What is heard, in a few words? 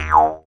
Jews Harp Funny Boin, cartoon; cartoon fx